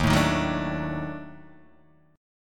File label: D sharp Minor Major 7th Flat 5th D sharp Guitar Open D